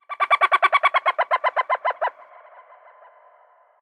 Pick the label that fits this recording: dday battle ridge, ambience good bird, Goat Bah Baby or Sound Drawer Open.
ambience good bird